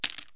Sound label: TOSS CHIP, CASINO